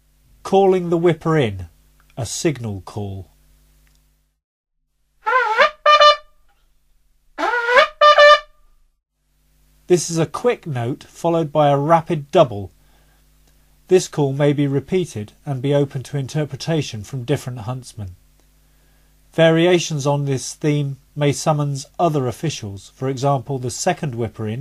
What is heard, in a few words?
CALLING WHIPPER IN, calls